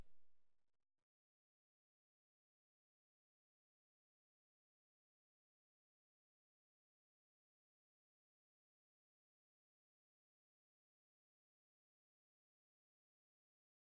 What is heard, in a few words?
vox